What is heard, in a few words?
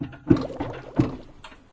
Sfx - Well